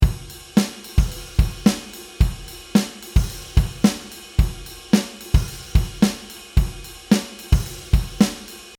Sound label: funk 110bpm